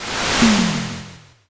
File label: fire magic